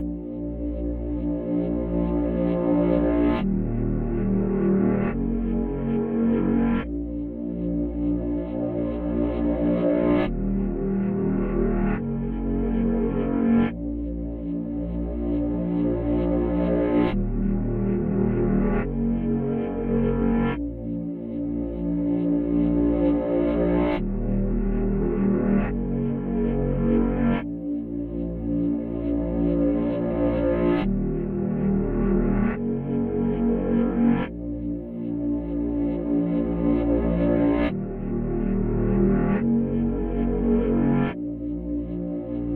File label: 140 BPM A# Min Bass, Reverse; Melody; Loop Stems; Cymatics; 2022 Melody Collection; Eternity